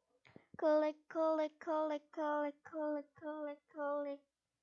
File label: click